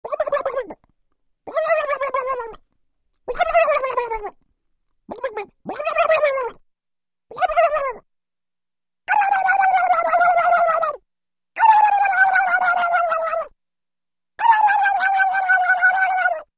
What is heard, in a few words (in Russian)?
imitacija zvuka indjuka